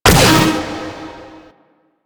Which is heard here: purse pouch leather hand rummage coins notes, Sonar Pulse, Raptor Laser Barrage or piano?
Raptor Laser Barrage